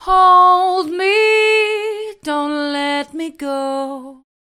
slapback echo dry

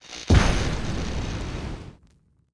electrical explosion

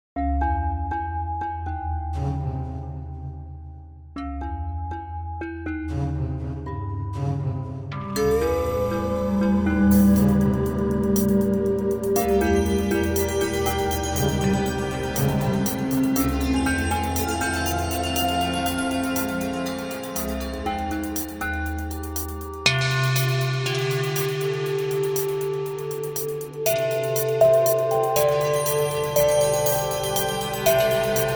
ocean drift

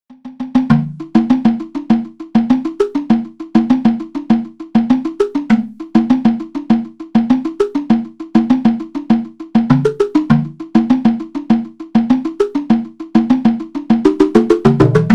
african percussion; syntheway

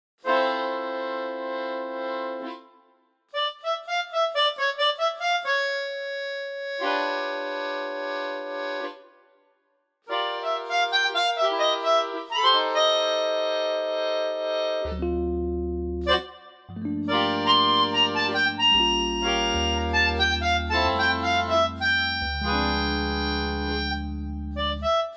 detuned; melox pro

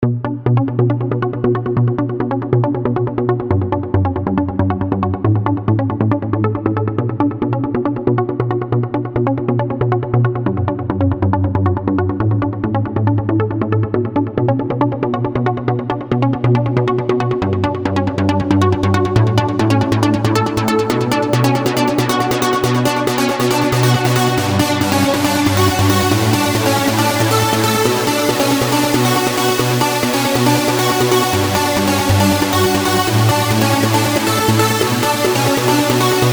super sync

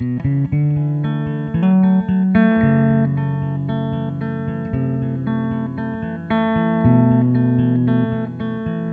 eb 108.5bpm; snowdon croce

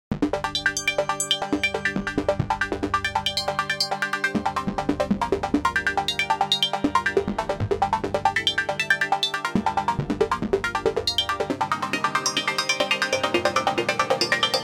minimal perc seq; pro12

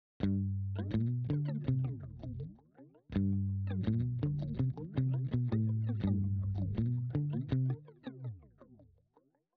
electric guitar wobble eko

